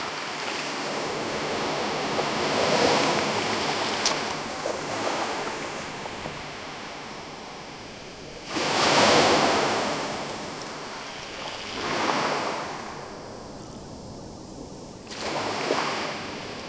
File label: jingle praia